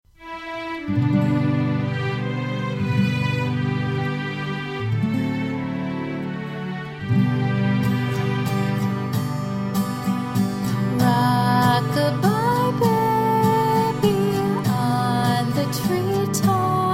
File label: rock a bye baby vocal